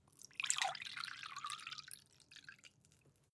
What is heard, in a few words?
mug pour; soundpool